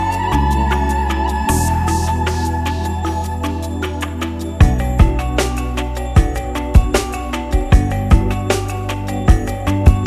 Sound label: cafe lounge; bali fashion